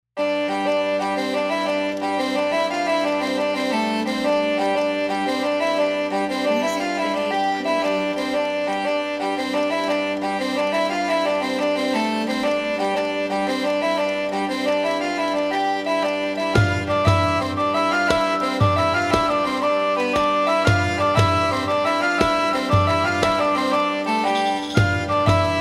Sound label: Medieval Tavern